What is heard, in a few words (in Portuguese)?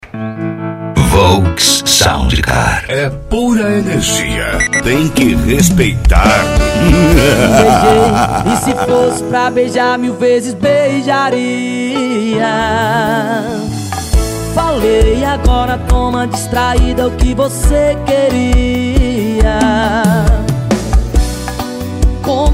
sertanejo e pisadinha; cd volks sound car